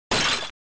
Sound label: ice explode